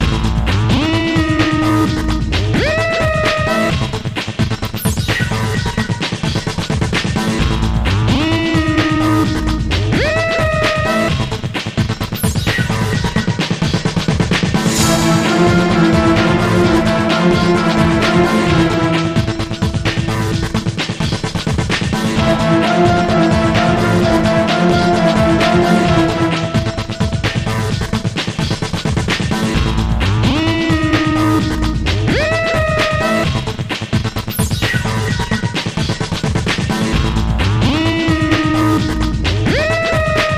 House arping

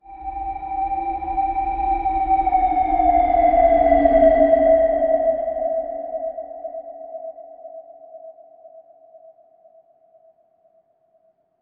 Mellow Whistle

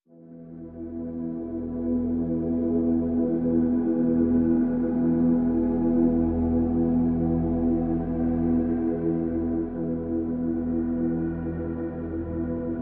Pads E

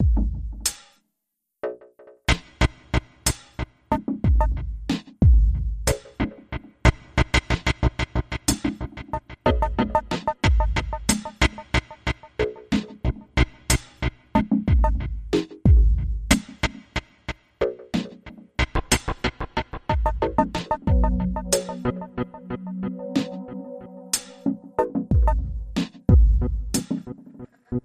Noise

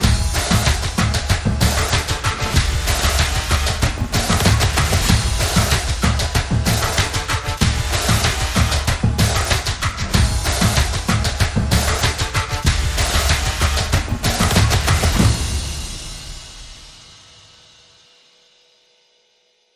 Drum Line

